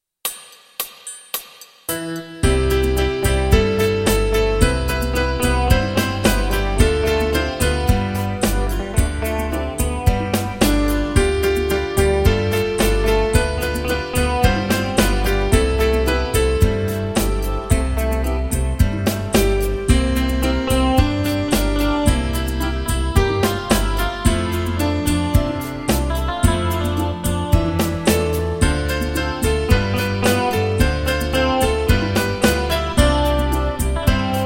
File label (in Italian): Piano tutti